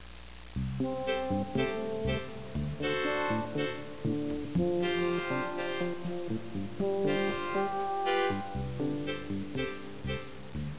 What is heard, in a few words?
Unused Music; Airport